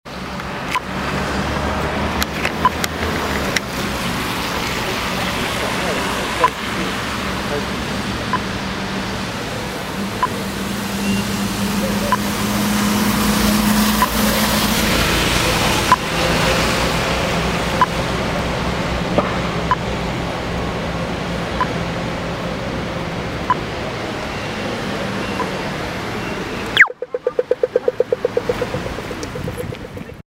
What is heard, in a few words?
crossing clicks